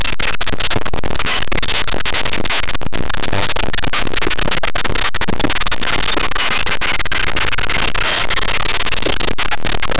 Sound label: man screaming supe; sound effect